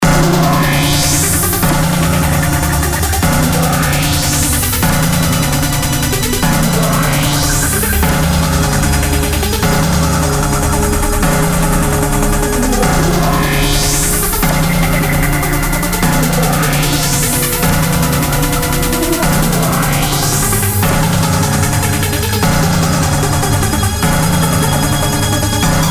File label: tension